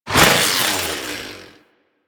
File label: Hand Saw Whoosh